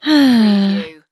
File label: Female Sigh